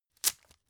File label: tissue open hard